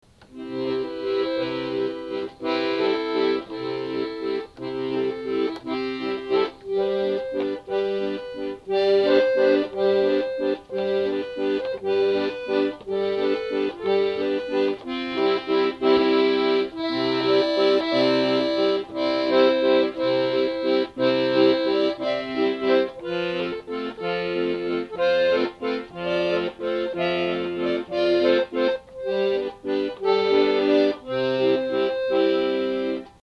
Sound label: accordion